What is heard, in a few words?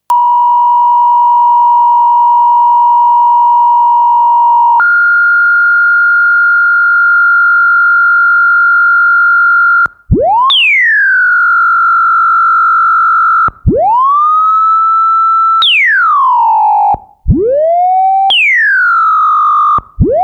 Glide On OFF